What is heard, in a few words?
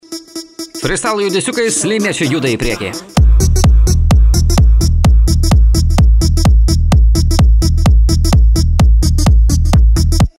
Mosquito; cstrike